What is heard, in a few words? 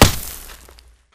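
Bullet Light Ballistic Dirt impact; Titanfall 2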